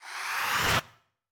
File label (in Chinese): 旋风人吸气声